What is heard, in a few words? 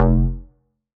Bass F, Bass Synth